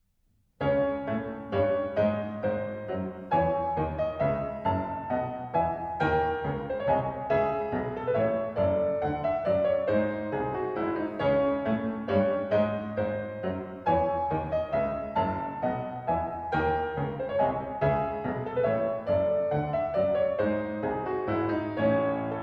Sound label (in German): Schubert Menuett cis Moll; D600